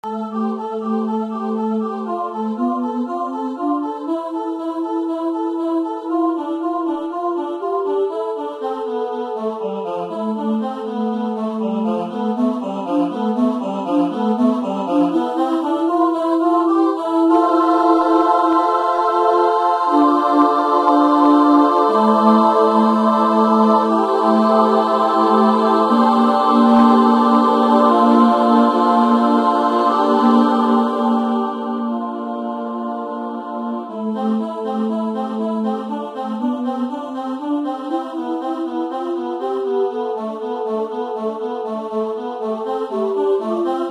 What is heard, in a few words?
air vocalise